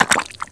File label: shellfish die